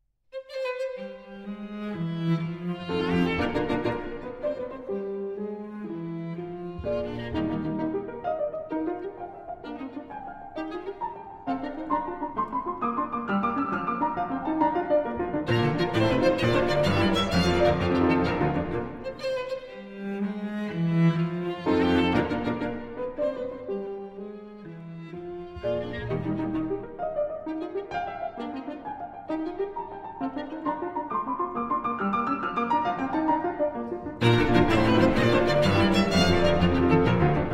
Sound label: Piano Trio in E-Flat Major, Scherzo. Allegro Assai - Trio; No. 1